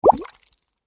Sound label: Water Bubble Surface Single; Nature